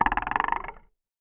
Box & Ruler, contact mic

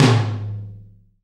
TOM TOM, Roland

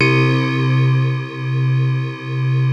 digital C2; FINE ART 1

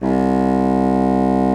BASSOON C2; Xsample VOL-6

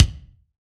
BD, BASS DRUMS; EdgeSounds

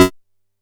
F#3, Bass; E-MU Producer Series Vol. 6 – Analogia Project; Boom da Bass

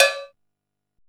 LOOSE cowbell; COWBELLS

